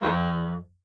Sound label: door creak, household; NS Studios - ultra sound collection